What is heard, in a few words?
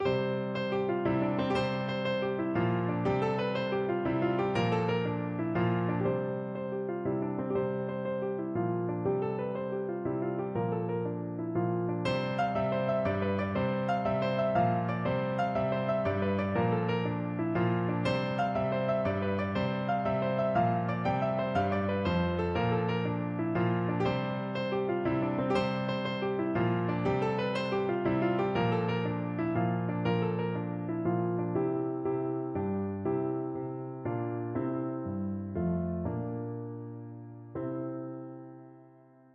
piano